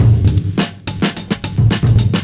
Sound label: 70s Breakbeats; USB Soundscan vol.46; AKAI